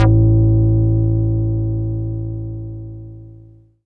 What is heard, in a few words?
Funkster a2, Korg Poly-800